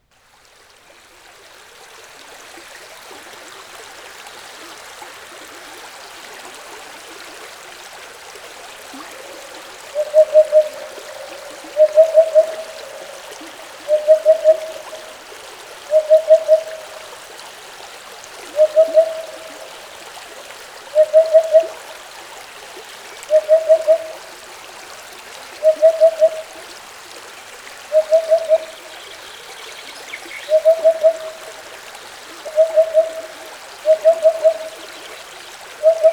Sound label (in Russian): nezamislovataya pesnya udoda u ruchya